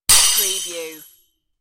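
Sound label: Small Plate Break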